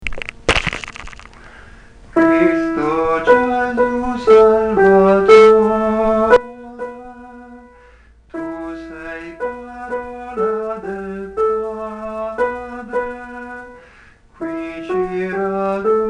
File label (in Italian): soprani; Cristo Gesu Salvatore